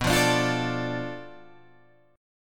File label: B Minor 7th Flat 5th B, Guitar Open G